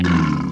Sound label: orc archer attack